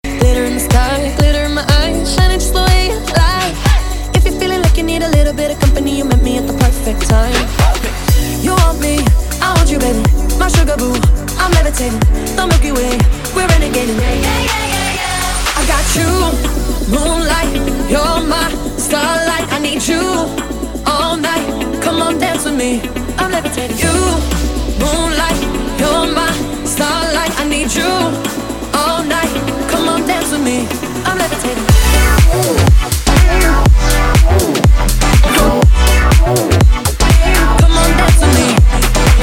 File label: Club Party Banger